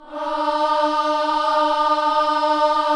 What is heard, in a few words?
KIDS CHOIR, Gospel